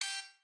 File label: Turret Button